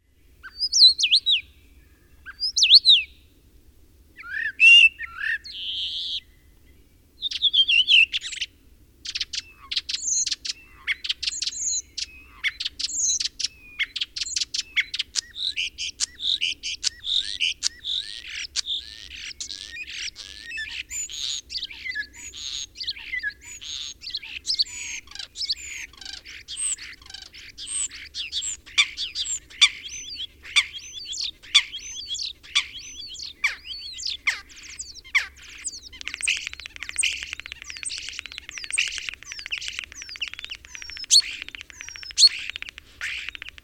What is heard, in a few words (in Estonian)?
kuldnokk, linnulaulumäng; spring